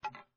cue hit ball, billards

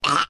dead enemy; cowboyrun

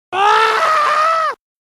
attack; groof runner

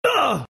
enemy touch; cowboyrun

